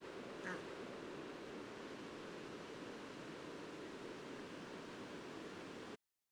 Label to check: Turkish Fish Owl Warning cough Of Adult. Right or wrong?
Right